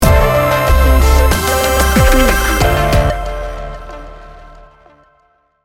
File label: funky chill hip hop sting bumper